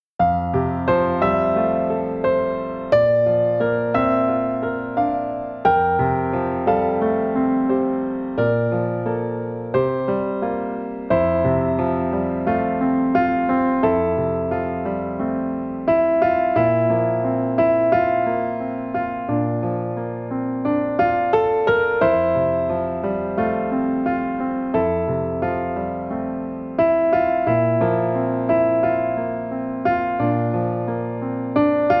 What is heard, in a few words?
accomp